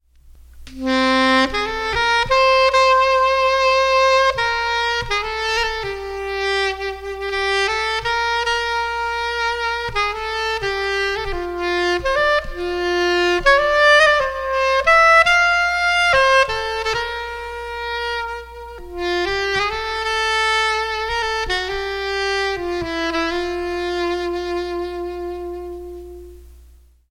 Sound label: reverb